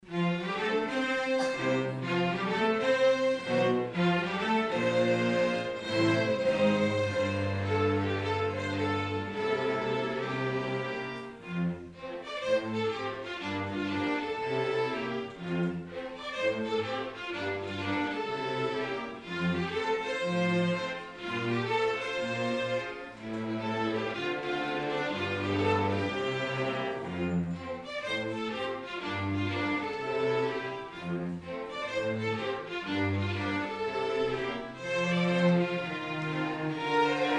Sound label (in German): Orchester Intrade in Jazz